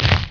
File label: rip arms; mk2; Jax